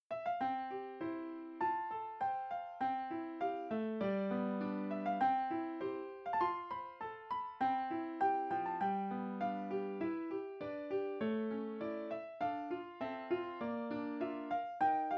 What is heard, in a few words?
Piano